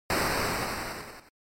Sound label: NES General Noise Single